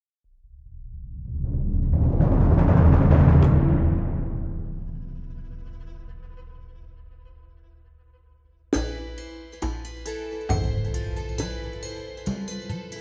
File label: Loop Carefree